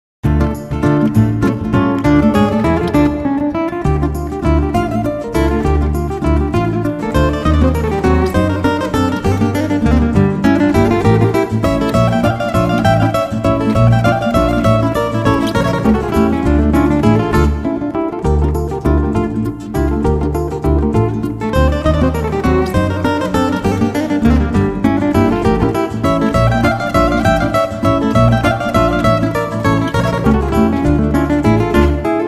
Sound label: Waltz; Buskers